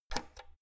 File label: oven knob turn hard squeaky